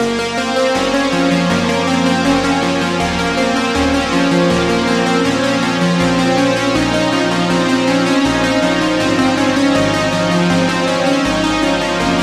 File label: Key Lights Out; Routed Sound; Vital Expansion - Reality Maker Vol.1